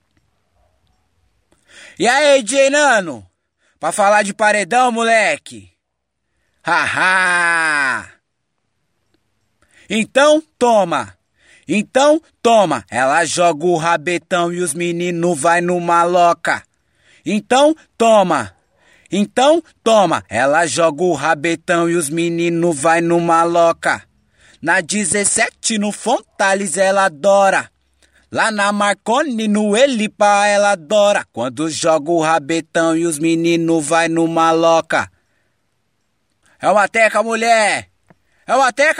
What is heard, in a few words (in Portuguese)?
Acapella